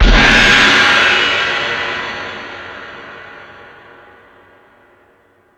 Crash 2, drum machine

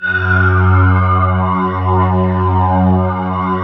SYN MORP, Roland

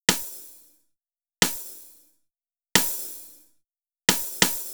Snare, Snare Patterns; Beat Components

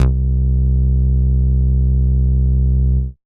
Moog Bass, Dance Synths

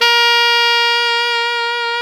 SAX ALTO MF; Roland - LA Composer vol. 1

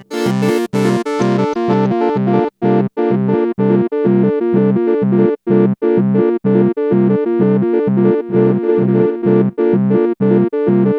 sweep, ambient atmospheres

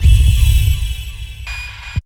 Low Whoom; Zero-G Jungle Warfare 1, 2 & 3

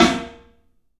PA snare; E-MU Producer Series Vol. 5 – 3-D Audio Collection; 3D Percussives

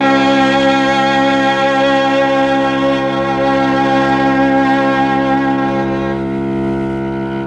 MEL STRING; ILIO; Propeller Island Legendary M400

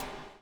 BUBL, BUBBLE; Spectrasonics - Backbeat

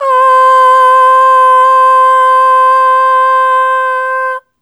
Aah Long C4 Dry; Harmonic Building Blocks

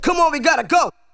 VOX SHORTS; Megabass Remix! 2

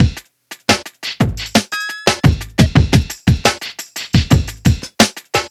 Drum n Jungle Turnaround

